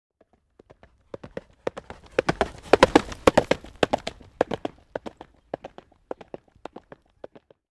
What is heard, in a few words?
Horse Gallop By On Har